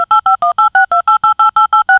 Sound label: phone in hand about to; sick